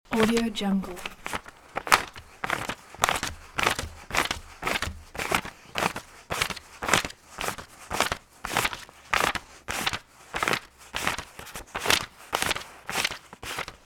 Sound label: manual counting banknotes on table loop; شمردن دستی اسکناس های; human sounds